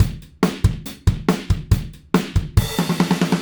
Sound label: Drums Rock Fill, loops; Alex Sanfilippo